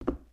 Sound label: Foot Wood Boot Walk; footsteps